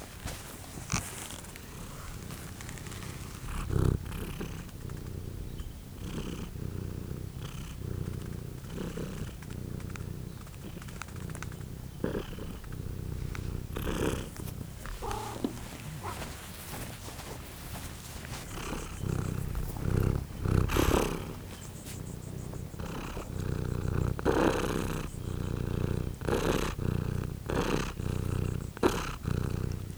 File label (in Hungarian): doromboló és nyávogó macska léggyel; állathangok; paraszt udvar